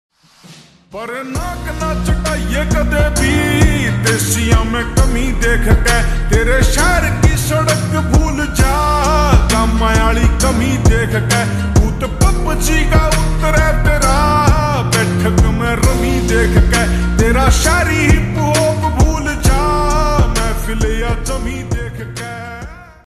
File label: Slowed Reverb Ringtone